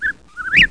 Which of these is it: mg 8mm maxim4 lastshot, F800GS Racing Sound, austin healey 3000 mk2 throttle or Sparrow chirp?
Sparrow chirp